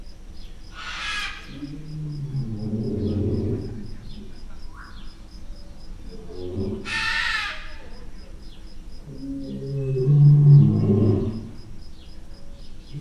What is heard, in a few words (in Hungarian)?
szabadban plexifallal korbekeritett allatok, oroszlan; Győri Zoo 2014